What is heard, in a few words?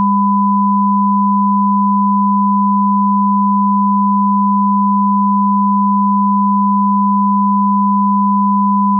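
sine 200+1000Hz mono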